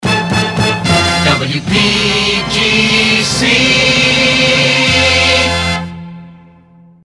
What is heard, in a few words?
jingles resings da da da da; pams